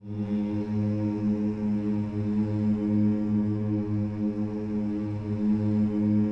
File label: HMMS G#2 A, gospel hmm; Spectrasonics; Vocal Planet Vol.1 Gospel; akai